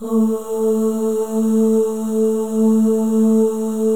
OH, FEM, Symphony of Voices; Spectrasonics

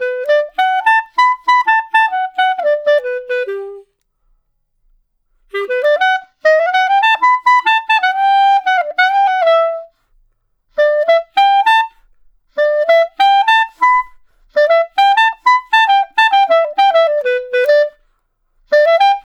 Clarinet One Shot, melody; Jazz Solos and Sections; Traditional; Clarinet Melody One Shots

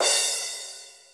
cymbal, Roland MC-909

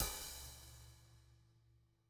CYMBAL, ELECTRO KIT

